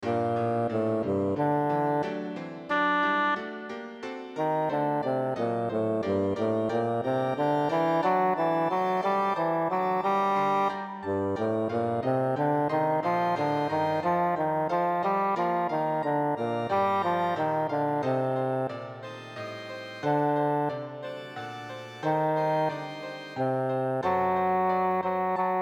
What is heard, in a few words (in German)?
aria con coro ohne chorstimmen; bach johann sebastian; johannes passion; 48 aria con coro eilt ihr